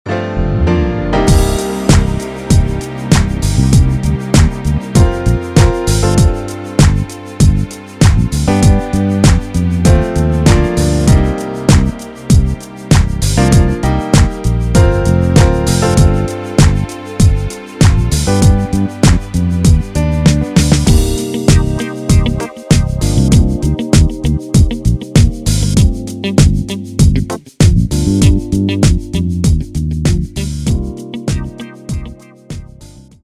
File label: RnB soul